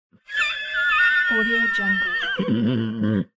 horse neighing

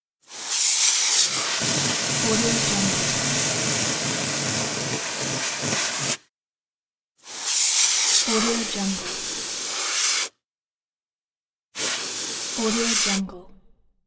fire extinguisher; moonaudioproduction

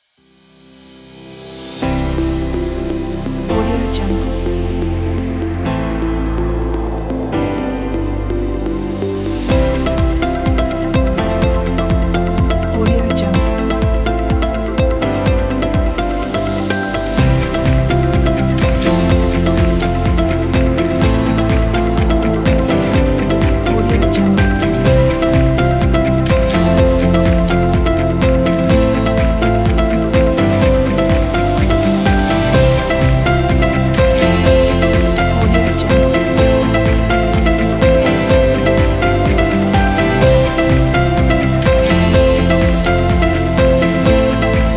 upbeat technology

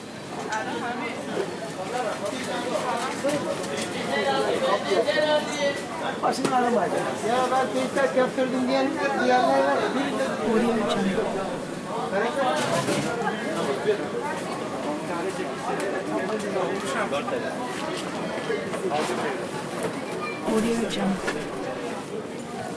ambiance market outdoor turkey istanbul loop; blazznet

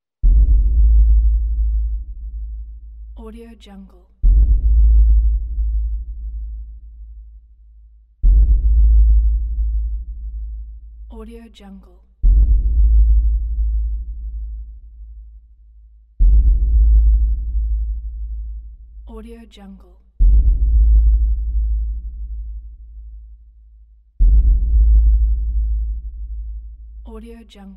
horror drum beat background

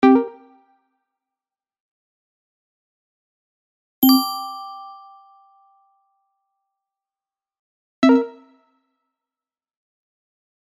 menu buttons clicks; 2fingersaudio

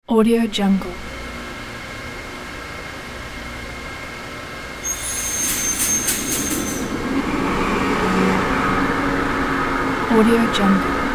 diesel cargo ship engine room start run stop; sound-ideas

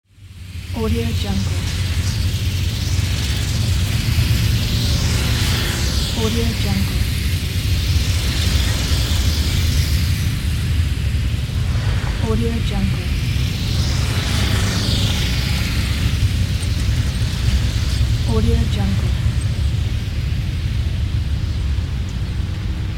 city ambience

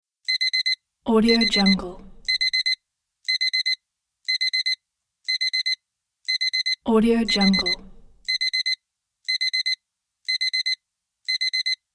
digital alarm beeps

